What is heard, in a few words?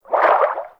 Personal Quarters Fish Tank Movement Quick; Warframe; Hub; Liset Personal Quarters